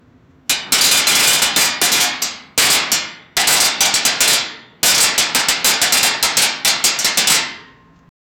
heavy metal pipe clattering on the ground, industrial